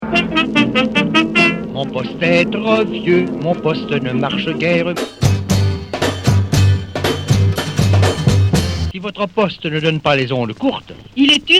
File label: Piano; RECYCLO